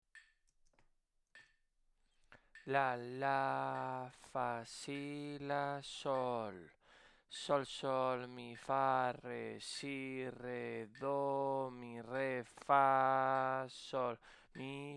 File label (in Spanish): RITMO